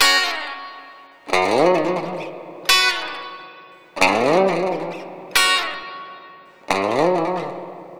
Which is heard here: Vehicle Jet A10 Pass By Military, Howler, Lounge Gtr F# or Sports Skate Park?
Lounge Gtr F#